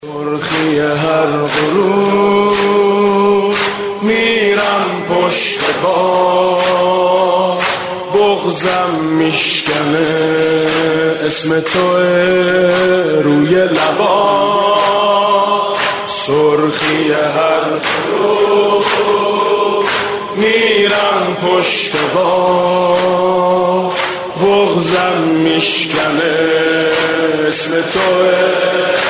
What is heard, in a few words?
religious ringtone